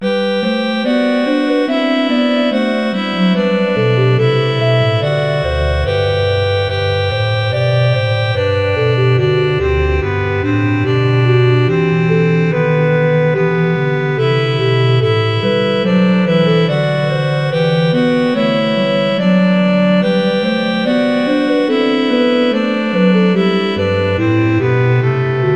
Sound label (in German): vom himmel hoch da komm ich her orgel